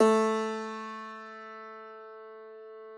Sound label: piano A, Thumb Tack Piano ff; Best Service ProSamples vol.53 - Classical Prepared Pianos; Total Piano